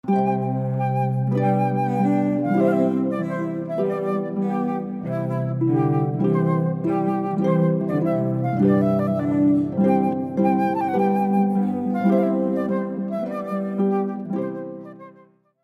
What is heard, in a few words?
Trumpet Tune, harp flute cello; Clarke